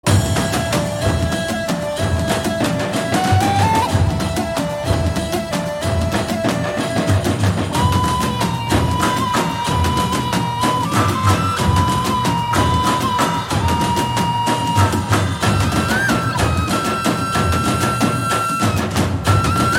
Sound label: A Moment of Trance Bgm